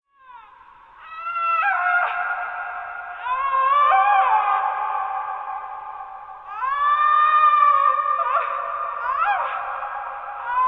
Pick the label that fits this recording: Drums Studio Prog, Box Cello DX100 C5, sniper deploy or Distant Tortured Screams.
Distant Tortured Screams